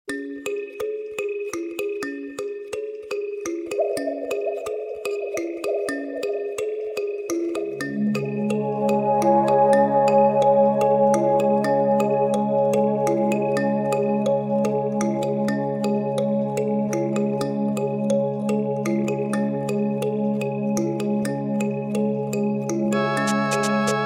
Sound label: Mbira